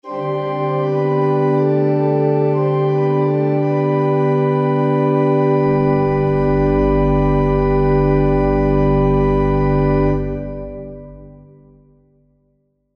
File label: solo pipe worship; cubase demo